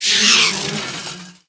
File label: Weaponized Wasp Death